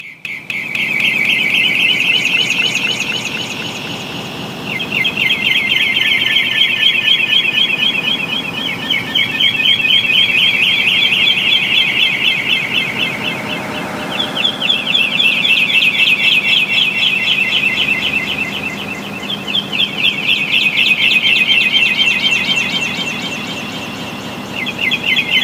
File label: loud bird echo